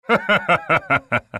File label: haha; NWNDS; nwnds audio